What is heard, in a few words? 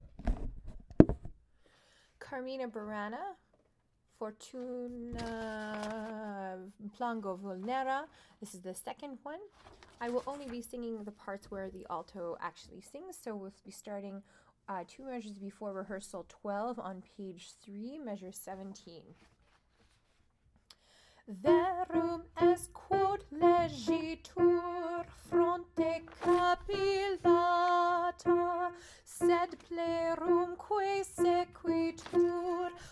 fortune plango vulnera alto, choir